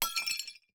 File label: Crash Glass Break Plate; crashes; AdobeCC